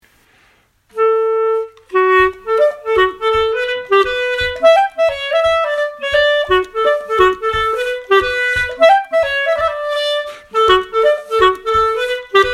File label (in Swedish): polska; Polska efter Tomt-Andreas; st mellby